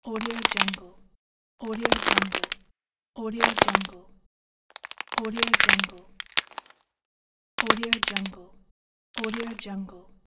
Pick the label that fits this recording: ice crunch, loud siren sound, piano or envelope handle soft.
ice crunch